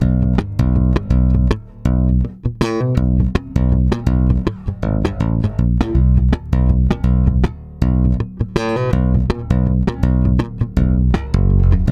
STRUM C#, Bass; Spectrasonics; Bass Legends; 88 STRUMMIN

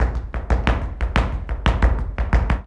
Flamenco, ZAPATEADOSOL

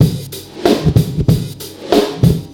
Hip-Hop, Bonus Loops; Sonic Foundry (Sony Creative Software); Hip-Hop Street Beats Construction Kit; Street Beats by Poogie Bell

